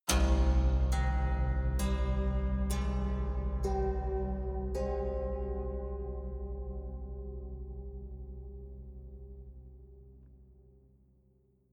haunted strings dark sequence

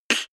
beatbox snare clap damped

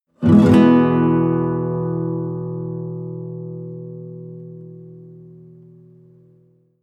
nylon guitar chord full major flamenco

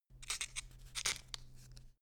quill write

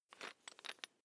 electric drill bit fasten wrench turn fast

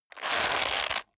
leather creak short hard

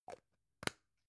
gum plastic box close soft

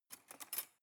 cutlery cake fork drawer grab soft

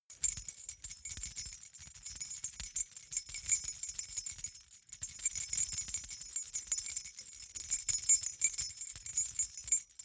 key ring small rattle air sequence

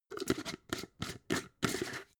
jar glass plastic close

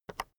keyboard external enter release soft

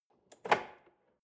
drawer large lock open

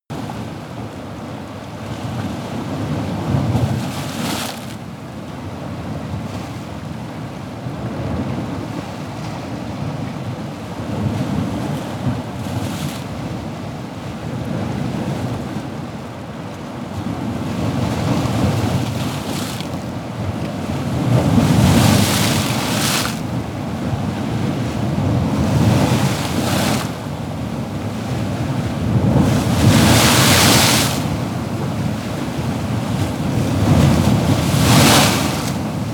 coast breaking waves

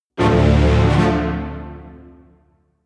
jingle orchestra dramatic hit

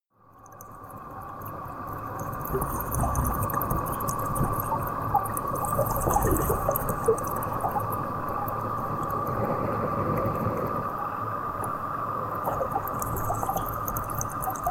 water fx sizzling

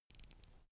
watch wind up

